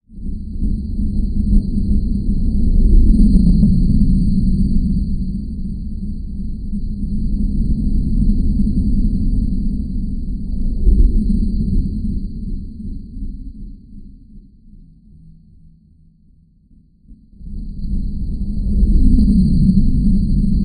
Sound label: Digital Low Pulsing Dig, granular